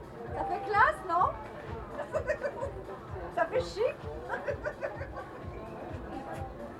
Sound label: Woman Laugh; Hyeres